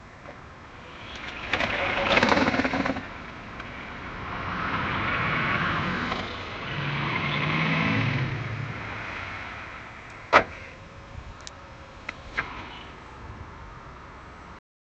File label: tyre rubbing when the car